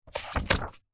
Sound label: household book thick hard back cookery page slide turn; zapsplat